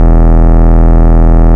Root E, Bass; Vengeance Samples; Vengeance Ultimate Bass

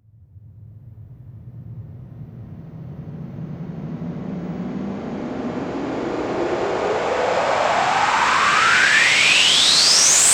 Sweeps Long

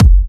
Bassdrum; Vengeance Samples

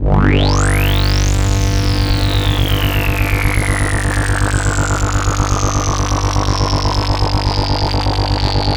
Roland C G1, Roland S100; musicradar-synth-samples

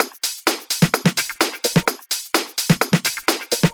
House Loop, 128 BPM; Vengeance Samples

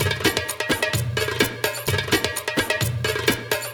Latin House Loop 128 BPM; Vengeance Samples

